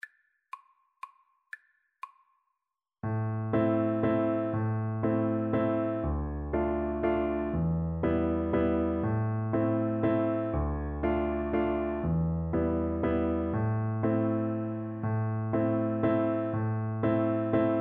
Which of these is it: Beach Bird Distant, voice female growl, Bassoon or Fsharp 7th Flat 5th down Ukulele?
Bassoon